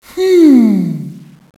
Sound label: hmmm, Echo inside caves, underground; DS; ML BOWSERSINSIDE; Mario